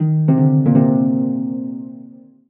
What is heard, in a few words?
Solo Harp Negative; MUSIC EFFECT; startide